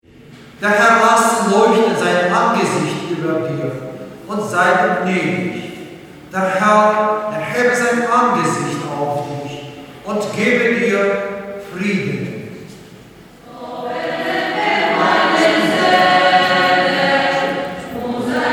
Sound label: German Prayer and Song